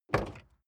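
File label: sports car exterior door open soft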